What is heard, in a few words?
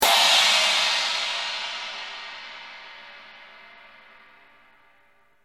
gong; Csound; harmonia